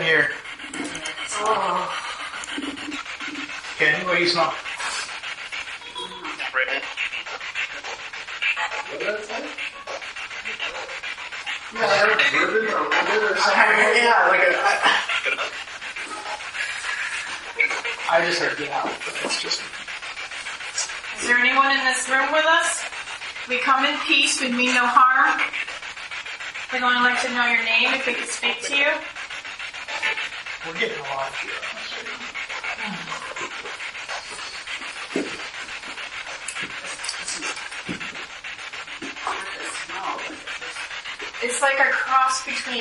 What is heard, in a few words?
Attic Surgeon Room Spirit Box; madison seminary investigation